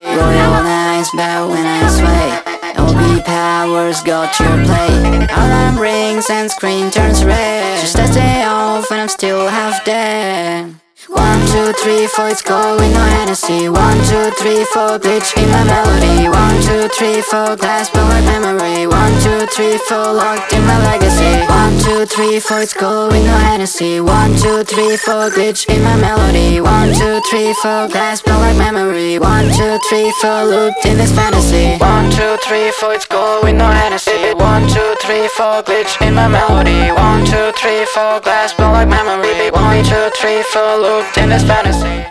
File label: pitched up